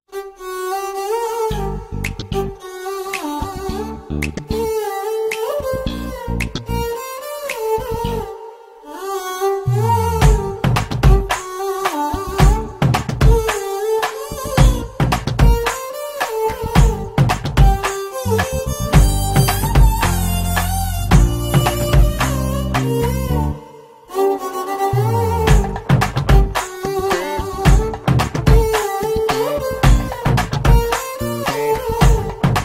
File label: melodious music